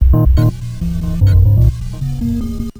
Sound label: Synth Bass